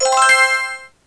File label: MENU; RPG Maker; Legend of Heroen v1.20